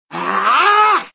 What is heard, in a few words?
growl build